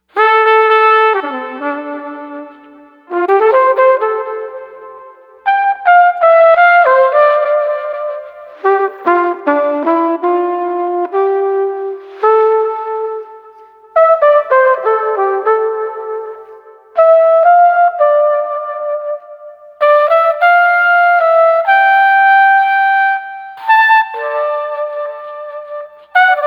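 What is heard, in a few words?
Street Flugelhorn Airy, 120 bpm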